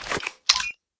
draw, detectors; bear; STALKER; IWP

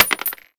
shell 9mm wood; STALKER; IWP

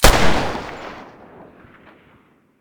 muzzle deagle mono, weapons

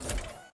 exo step; STALKER; IWP

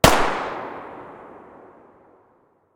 tt33 muzzle mono distant

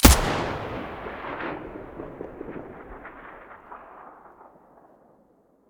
hk416 muzzle stereo

